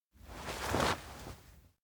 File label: face to face; awayuki; anemoi